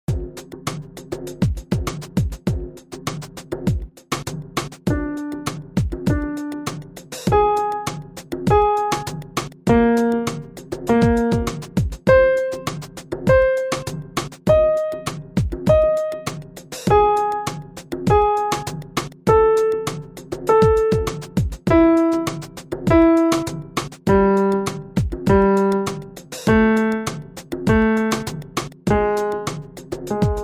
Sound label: Practice Track; SLYSCD3-CandleExercises